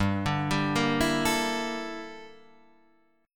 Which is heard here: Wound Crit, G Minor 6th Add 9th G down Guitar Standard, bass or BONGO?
G Minor 6th Add 9th G down Guitar Standard